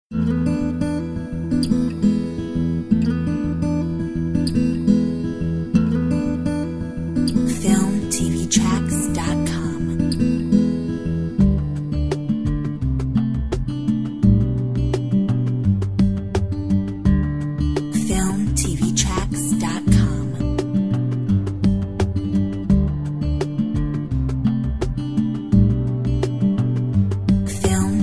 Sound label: Southern ballad